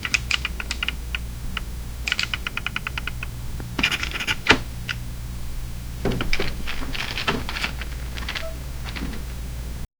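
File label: steady clacking of computer, headphones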